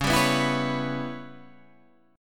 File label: C sharp Minor 7th Flat 5th, Guitar, Standard; strummed; 9,10,9,9,12,9; JGuitar